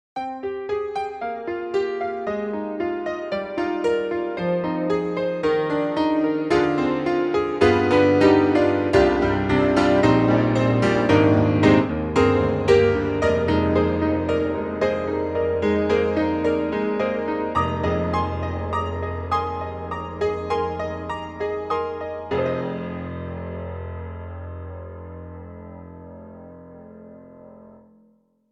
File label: Imperial Grand Chorus Grand; IK Multimedia; SampleTank3